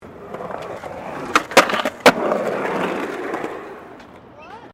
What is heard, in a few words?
SKATEBOARD FLAT JUMP GRIND; Skateboard sounds; Skateboarding; Sports; Foundation4